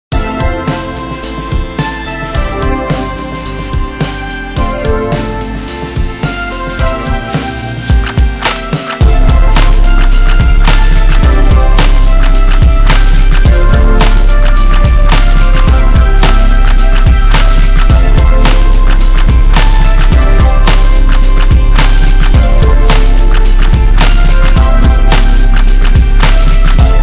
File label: FANFARE